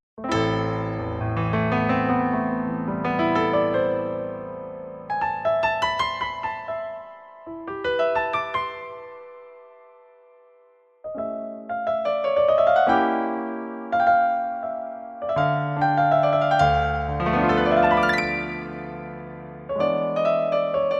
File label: Piano Solo